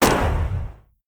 light gunshot; factorio; Factorio 0.14.21; fight

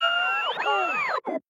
flying robot; factorio; Factorio 0.14.21

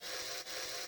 inserter long handed; factorio; Factorio 0.14.21

